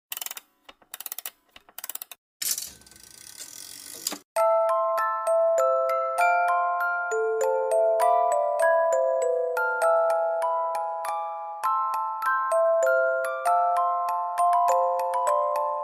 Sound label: music box; fable 3 ost